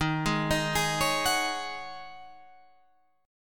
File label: Db Suspended 2nd Suspended 4th Eb